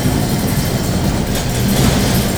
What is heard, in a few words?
reentry gate sound